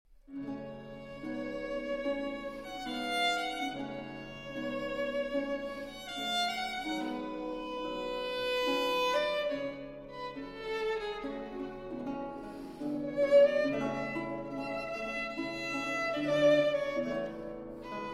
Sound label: Violin Sonata in B-Flat Major, Adagio; Sonata F.XIII no 16, RV34